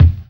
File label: clean bass drum E key; kick drum samples